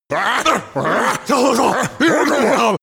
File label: excited trolls